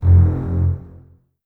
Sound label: Basses F 1 Detache; String Ensembles; Miroslav Vitous; 90 s Sample CDs